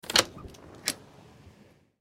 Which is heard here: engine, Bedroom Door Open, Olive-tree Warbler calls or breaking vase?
Bedroom Door Open